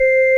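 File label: ORG Vox, Continental organ; Keyboards of The 60's and 70's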